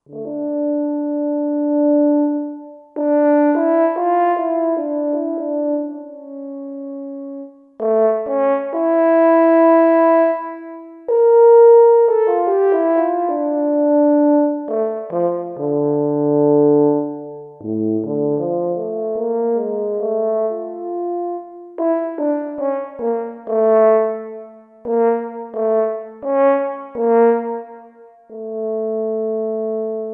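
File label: Solo French Horn, Roland SR-JV80-16 Orchestral II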